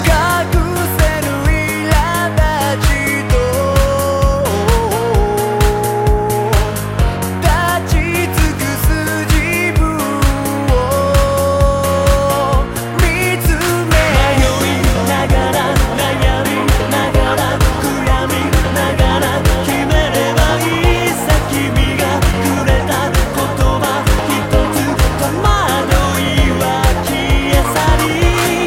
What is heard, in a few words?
Piano Mix